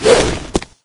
attack no vo, wraith; doom3

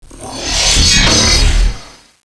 cloak; cso

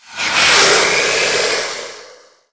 spider monster dying

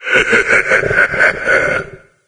taunt; doom3; sabaoth

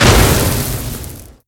impact ground light

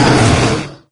pain, wraith; doom3

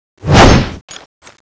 paring slash, weapons; tfa cso; holy sword

